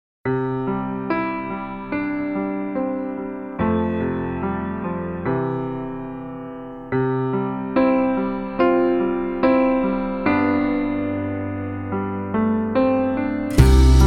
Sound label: refrain (non vocal); bengarachou hakubutsushi